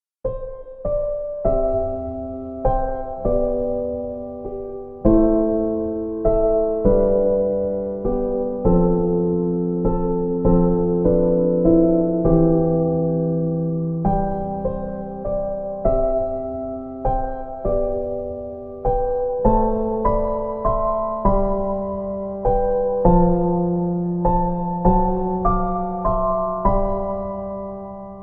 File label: Piano